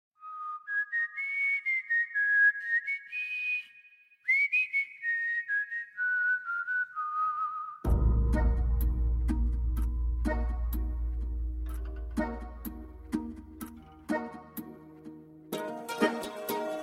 Teenage Whistle

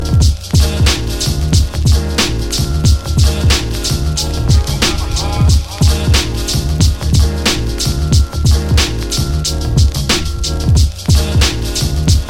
Hip Hop Tone

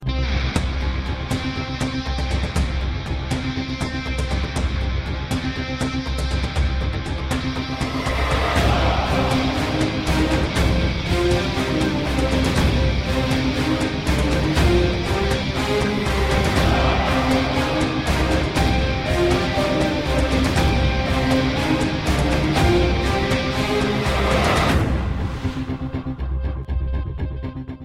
Intense Beat

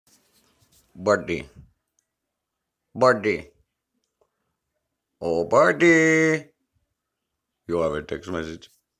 Message

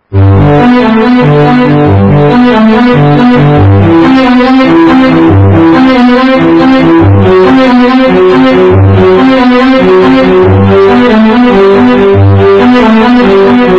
Cello Suite

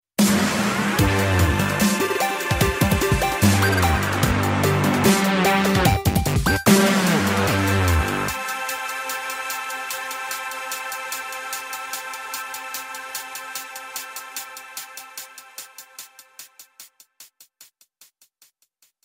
Start; Splatfest

